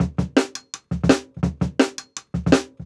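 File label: Jungle Breaks, 168 bpm; Original Jungle Breaks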